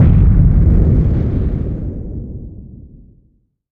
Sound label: bomb; Weapon-Explosion